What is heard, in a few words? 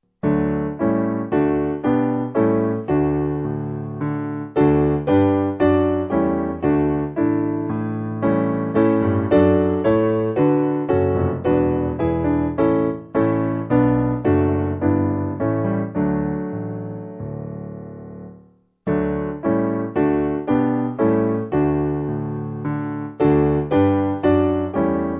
Franconia Piano; Blest Are The Pure